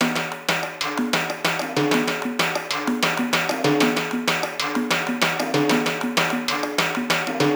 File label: Conga n Timbal, Percussion Loops